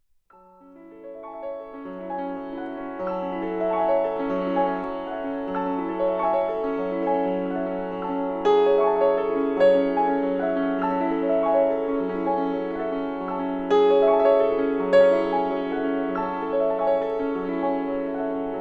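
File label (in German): klassik klavier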